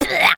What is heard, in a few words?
cartoon character spit out disgusting; zapsplat